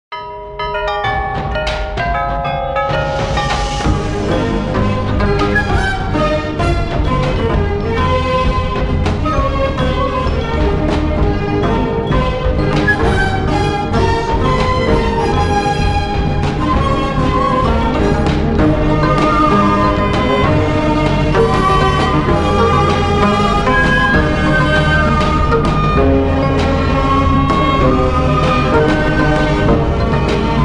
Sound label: samsung galaxy a55